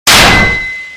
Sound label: Metal Punch